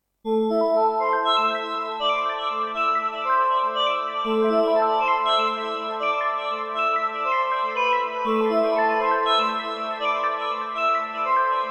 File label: Iridium Core Singing Sparkles